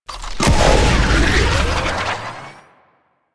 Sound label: Hoverboard Boost Fast; Warframe; DE; Vehicles; Venus